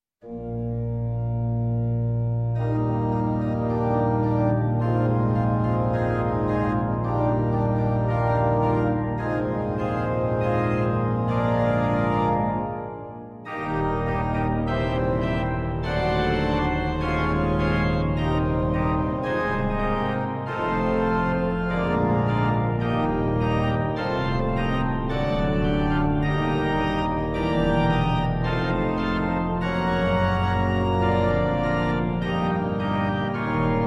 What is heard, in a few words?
Crown Him with Many Crowns Hymn Prelude